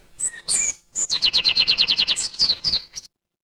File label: animal bird